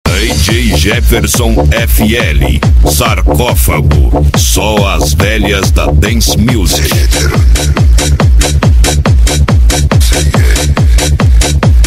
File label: dance musik